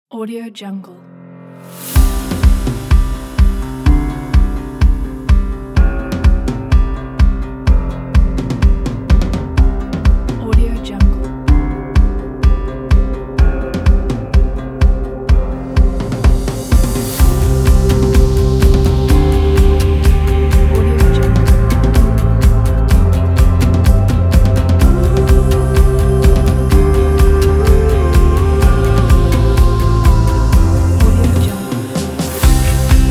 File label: inspiring motivational upbeat indie pop; audioshadow